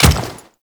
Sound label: Default Step, Footsteps